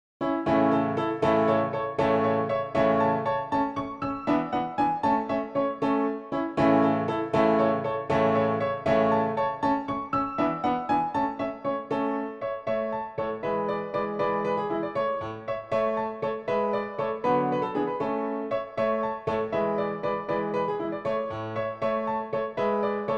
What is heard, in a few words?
Dance PianoTeq Bechstein; Dittersdorf 12 English Dances; Dance No5